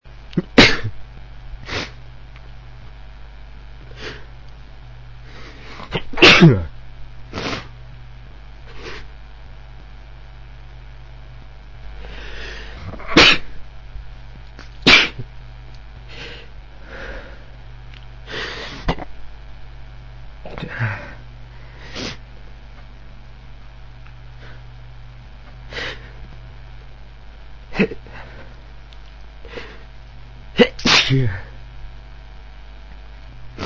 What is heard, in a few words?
more sneezing, male